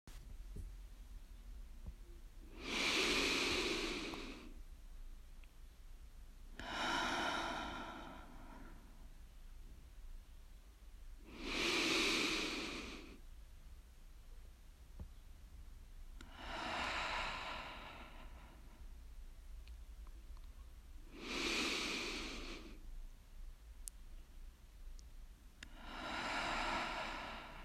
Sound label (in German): Atemgeräusch; menschliche laute